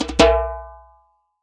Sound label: drum, mixed drums, World Instruments